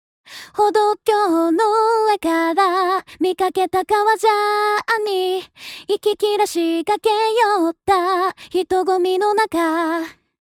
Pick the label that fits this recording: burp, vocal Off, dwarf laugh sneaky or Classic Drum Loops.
vocal Off